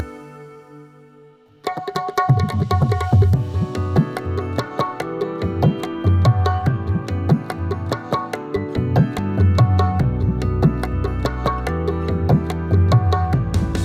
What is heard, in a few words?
tabla